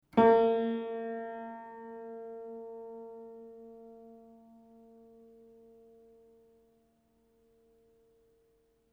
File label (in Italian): LA diesis, Clementi Grand Piano